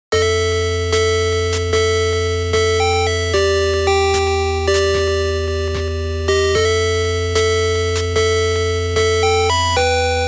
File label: Underwater Cave; The Sword of Hope II; Skuner; ROM Cassette Disc in KEMCO